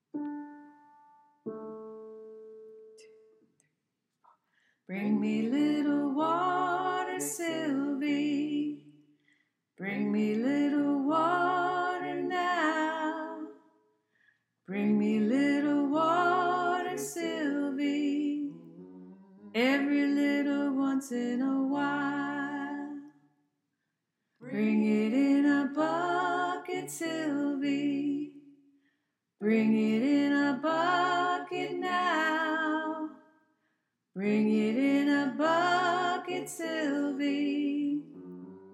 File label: alto, choir